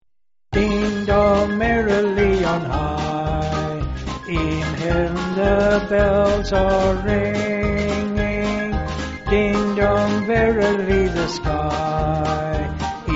Ding Dong Merrily On High, French Melody

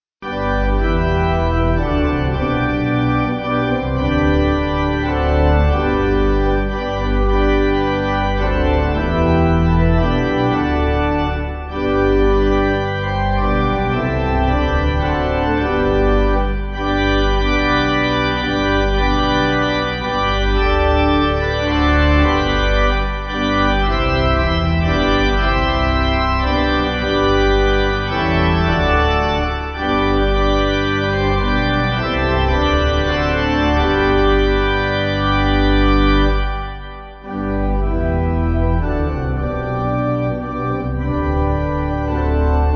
Pipe, 5v; Mi Dafla Maich; Tyddewi

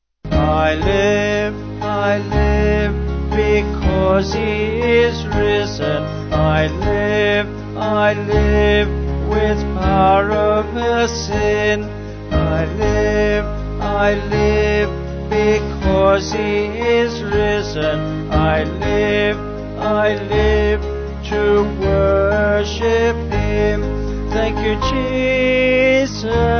Vocals Band

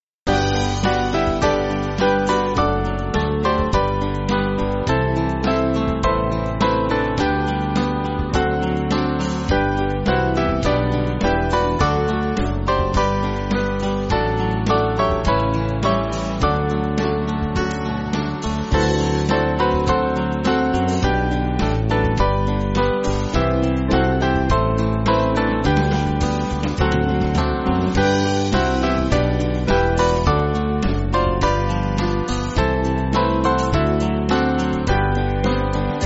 Band, Backing Files for Churches; Saviour Blessed; Norfolk Park

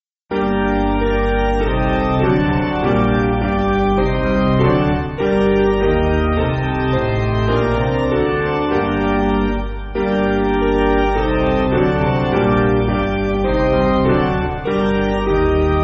Piano, Backing Files for Churches; Basic Piano & Organ (CM)

